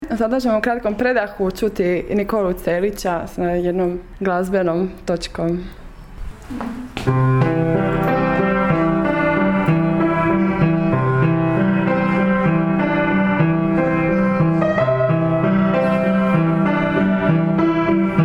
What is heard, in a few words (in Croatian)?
klavir